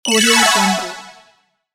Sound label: cartoon